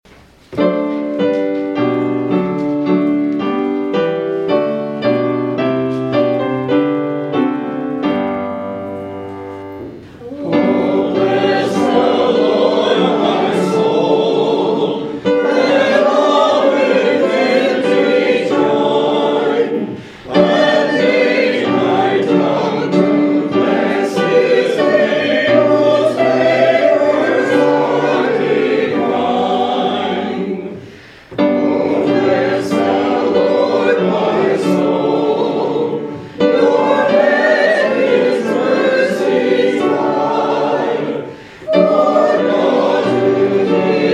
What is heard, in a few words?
Hymn; O Bless the Lord, My Soul